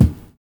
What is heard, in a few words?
LOW TOM O; Univers Sons - Kitch 70s